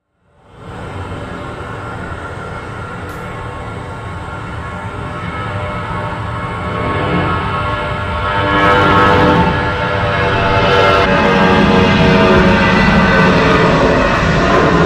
Airbus; تیکاف

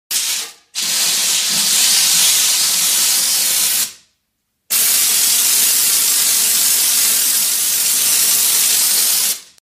Fire Extinguisher

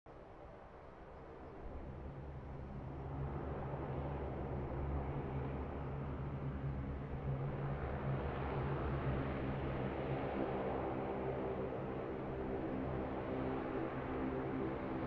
Lockheed C-130 Hercules

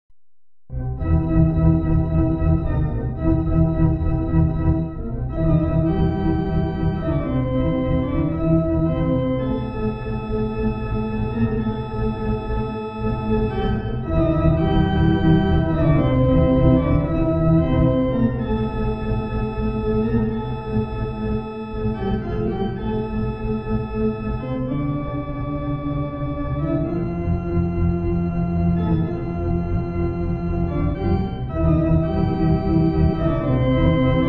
Calliope

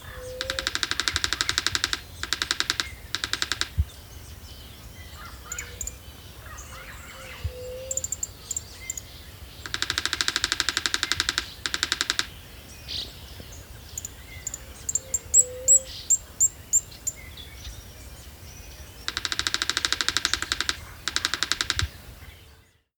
Piculet

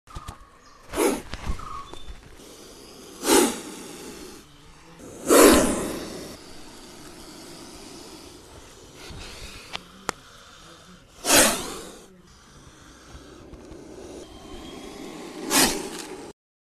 sound snake

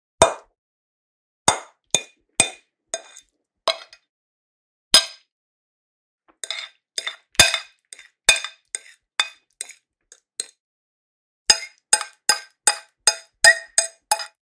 plate

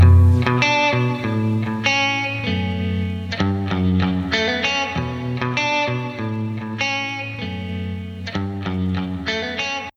ringtone ding ding

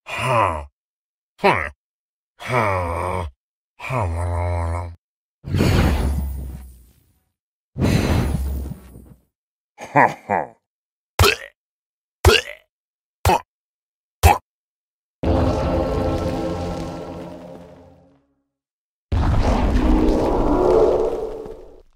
Minecraft Evoker; ایووکر ماینکرافت

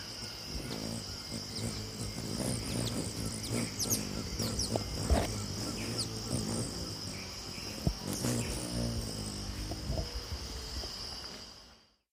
Frilled coquette

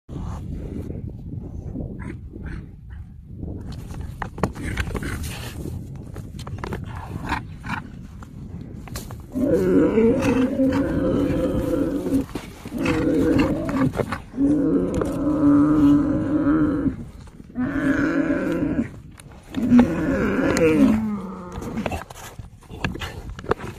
yak; غژگاو; یاک

